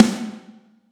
soft hit clap

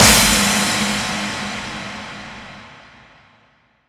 snare wave

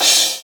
Crash short

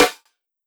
snare drop

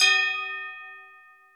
bell D#7; osu

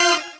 normal hit normal

